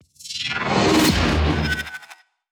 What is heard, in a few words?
Intro Menu; Hyperdrive Massacre